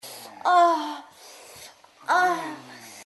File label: moan; Low Blow